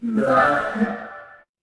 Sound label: feedback error